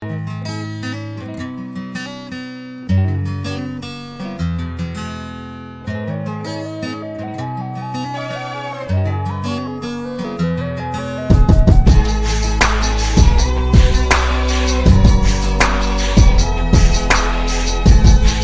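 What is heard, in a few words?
surrounding ringtone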